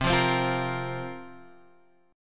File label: A sharp Suspended 2nd C